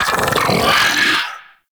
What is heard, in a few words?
Arachnoid Berserk Vocal Rupture Inflate; Enemies; Vocals; Warframe; Corpus; Arachnoid Hunger